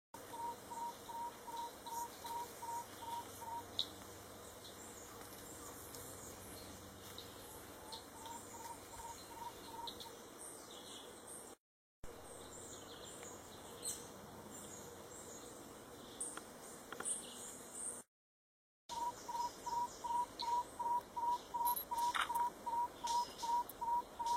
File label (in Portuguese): Canto do tapaculo pintado